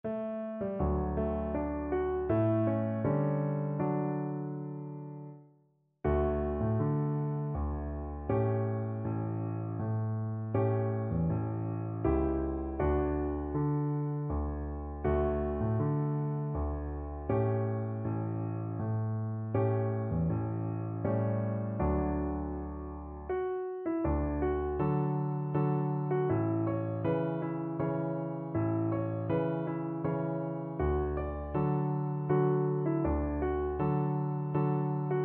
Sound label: es wearn viola